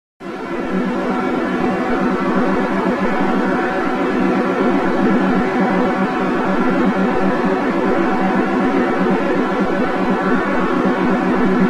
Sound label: 12 String Instrument Test